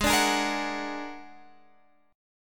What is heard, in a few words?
Bb Augmented 7th Ab, chord